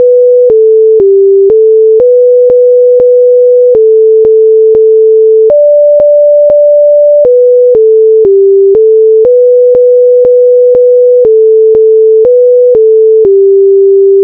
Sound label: sine